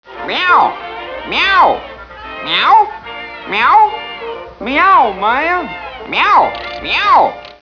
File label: meow cartoon meow